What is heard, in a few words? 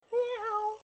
meow